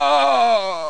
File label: death; terror